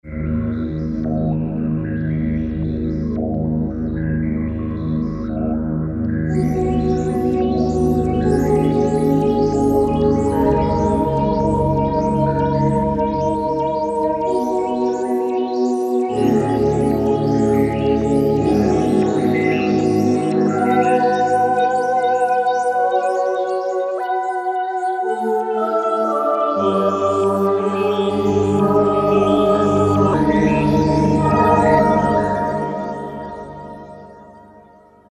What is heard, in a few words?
Wind Vox, Kurzweil K2000; Kurzweil K2000 V3; Factory Programs